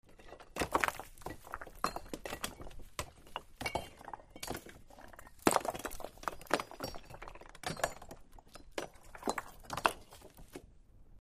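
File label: Ceramic Rock Movement, rocks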